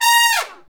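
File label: trumpet fall; Roland L-CDC-01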